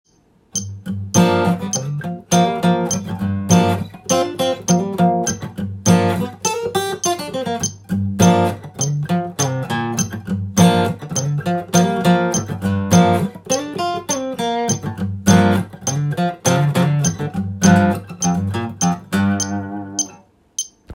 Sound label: g minor solo, guitar